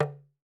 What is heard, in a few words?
TAM TAM, African Drums